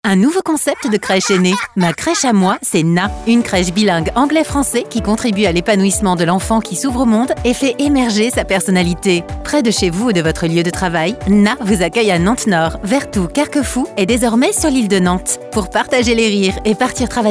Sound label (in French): MUSIQUE; CRECHES